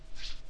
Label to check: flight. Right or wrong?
Right